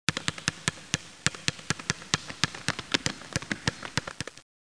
Dribbling; Florida State Football - Ultimate Multimedia Experience

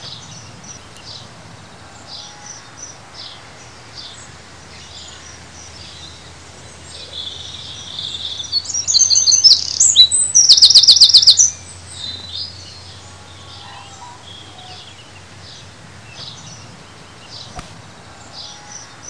ATMO; TKKG 2

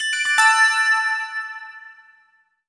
message; ManaLink

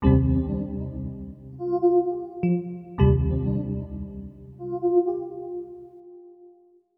SYNTH D+B 160 C, Drum+Bass, Keys; eJay

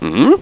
exclaim; Bonzala; Revista CD Expert 50

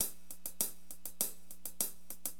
DM LOOP, HipHop, Drums; LiveAct Pool; AcidJazz Funk

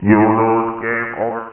game over; cycles

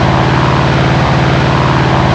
engine; pcdemo

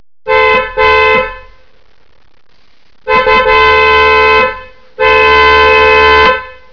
car horn

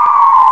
scream; duke nukem total meltdown

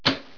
SUITCASE; 10,000 Graphics Pack Volume 1; Media Graphics International

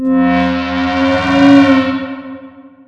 Alien; Sound Sculptor; Fun Stuff

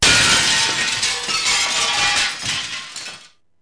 GLASS

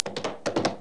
horse maximize

